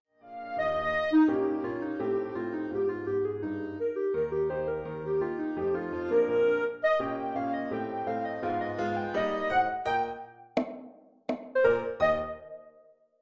The Ragtime Dance Clarinet SOLO Tag ending; Woodwinds

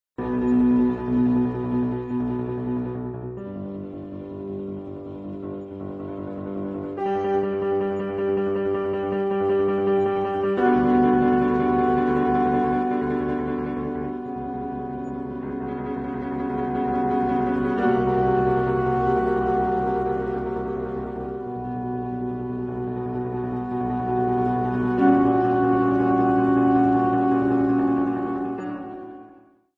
Flute Quartet w Piano; Catacombs; Pictures At An Exhibition

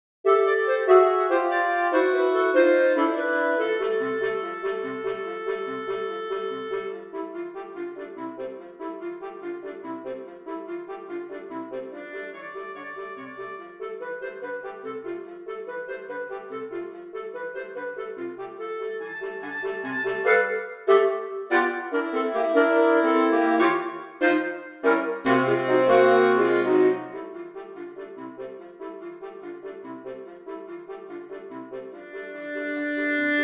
Charleston; SCHULHOFF; Six Jazz Scenes; Esquisses de Jazz - Jazz Scenes; Saxophone Quartet

